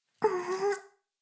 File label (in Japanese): フェラ音声; 古き城のドラゴニート2